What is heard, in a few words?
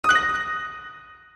match